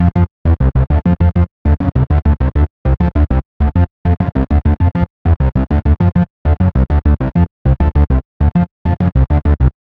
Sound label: acid loop; chemical